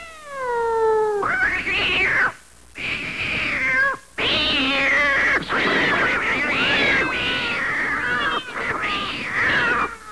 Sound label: cats fighting; pets; Sound Effects for Multimedia - Volume 1